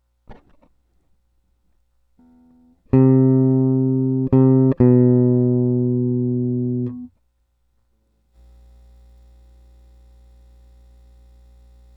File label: Elect Guitar